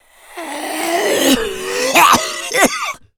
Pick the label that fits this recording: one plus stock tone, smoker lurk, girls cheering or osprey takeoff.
smoker lurk